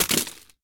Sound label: mag drop pistol; universal